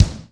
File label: GATE KICK, DRUMS; East West - Global Sounds